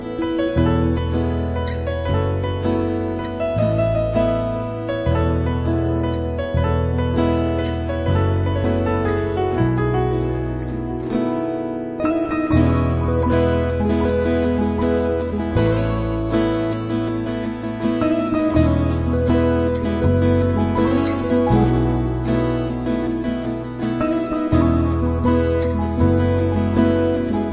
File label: Piano Romantic